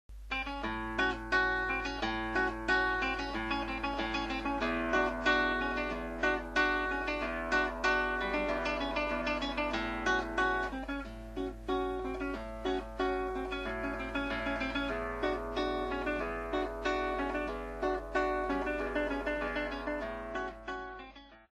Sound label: guitar flea waltz; Jolly Guitar